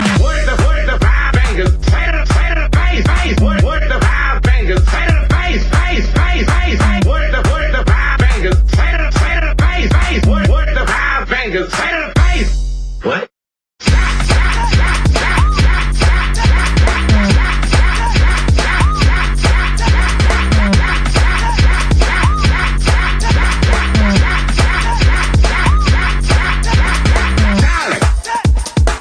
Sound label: Baltimore Remix